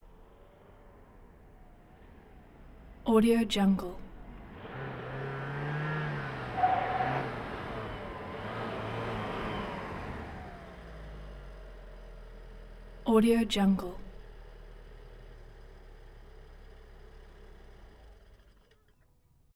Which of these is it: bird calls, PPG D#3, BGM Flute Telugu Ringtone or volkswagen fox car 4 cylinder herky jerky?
volkswagen fox car 4 cylinder herky jerky